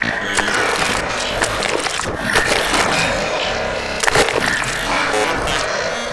gore machine; Quake 4 Alpha; id software

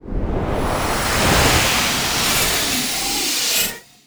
powerup; Quake 4 Alpha; strogg; valkaryne

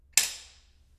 table foley; DoomRTX

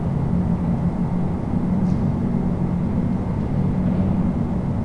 medbay ambience; Quake 4 Alpha; id software

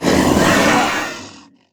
imp die, monsters; DoomRTX; imp5

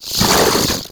imp attack melee, monsters; DoomRTX; imp5

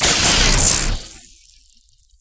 retch fire, strogg; Quake 4 Alpha; id software

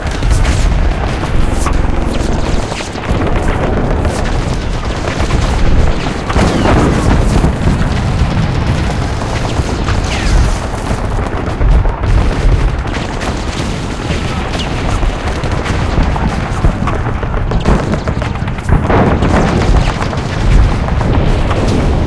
close battle loop, ambience; Quake 4 Alpha

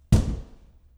table foley; Doom

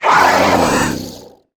imp die, monsters; Doom

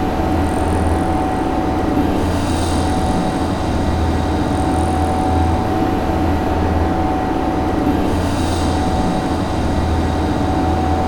computer station, ambience; Quake 4 Alpha

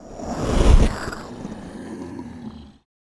pinky chatter, monsters vocalizations; DoomRTX

